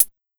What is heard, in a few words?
TIC, Big Beat; USB Soundscan vol.25; Big Beat Drumloops; AKAI